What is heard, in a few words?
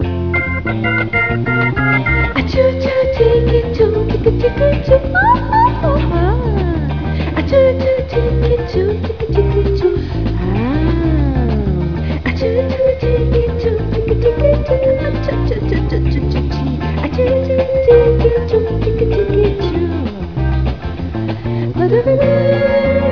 beat